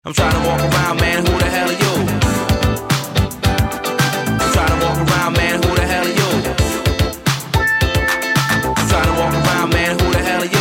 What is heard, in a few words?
Disco Grooves pop pulse